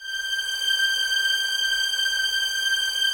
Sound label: G 6, 11 Violins, Soft; Miroslav Vitous